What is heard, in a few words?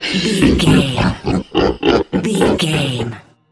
troll monster laugh